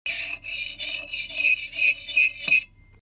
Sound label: Glass Scratch